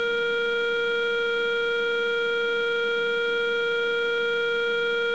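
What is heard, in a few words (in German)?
Telefon frei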